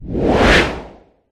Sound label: swing; operation